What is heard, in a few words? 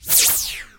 light sound; LightRays; Action Games